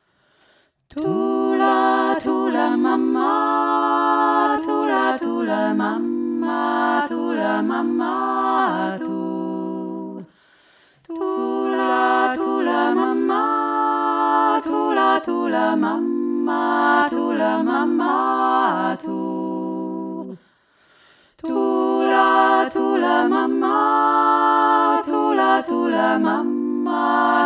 thula mama afrikanisches lied

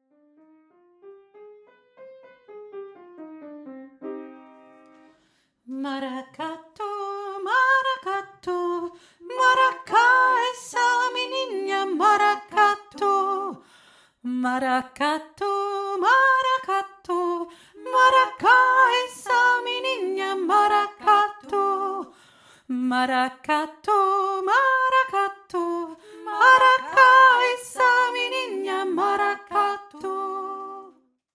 mara ka tu hohe stimme

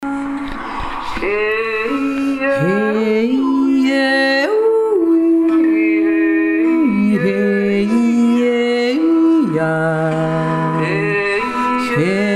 eissch tzenjodler kanon

